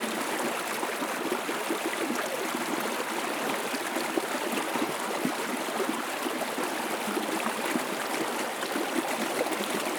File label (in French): ruisseau bouillonant creux